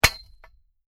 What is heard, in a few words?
Metal Impact Rock Drop On Metal Pipe; Stone slab, brick or cynder falls on solid metal with a good clank.; Metal Sound Effects